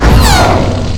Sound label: lightsabers swing cross; star wars; adv lightsabers